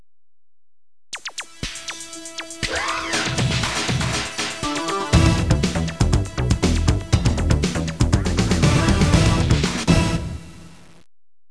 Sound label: dance